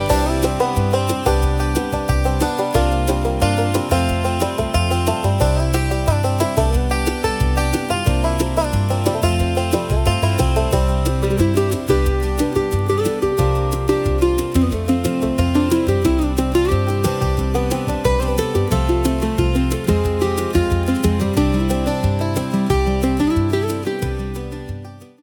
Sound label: Bluegrass